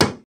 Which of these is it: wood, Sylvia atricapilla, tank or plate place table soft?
wood